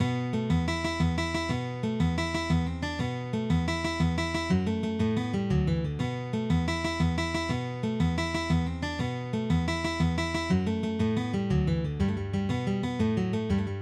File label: Slip Jig; Humours of Whiskey, The